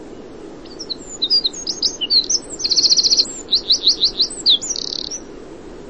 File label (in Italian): Scricciolo Comune Troglodytes troglodytes